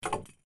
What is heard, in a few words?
drop, inventory